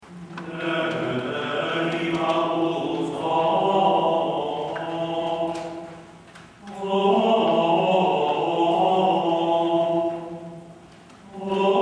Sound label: organum